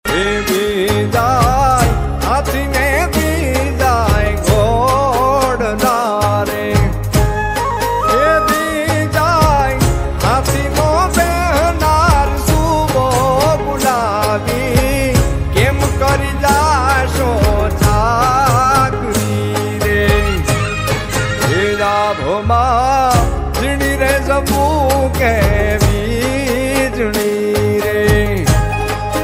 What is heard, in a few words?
gujarati ringtone